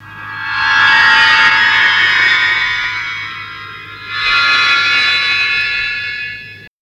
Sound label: glass, fx & sweeps; EZRollers